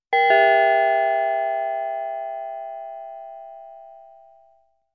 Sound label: Ding-dong